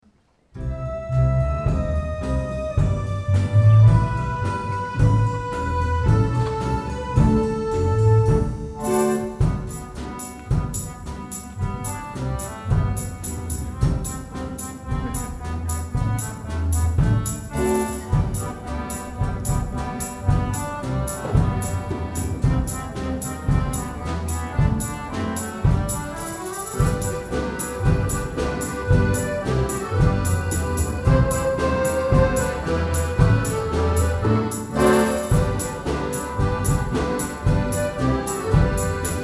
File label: Jingle Bells